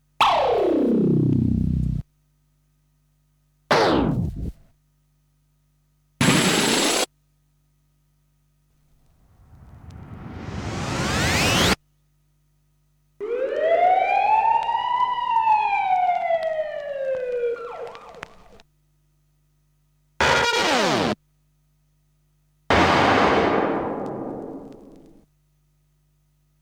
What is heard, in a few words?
Sound Effects; Kniteforce; 1000 Samples Volume 1